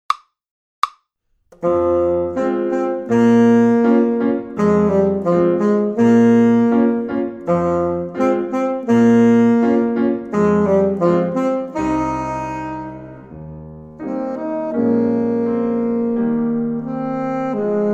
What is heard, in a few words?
saxophone; avec saxophone